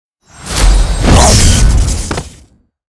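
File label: guardian Anubis spawn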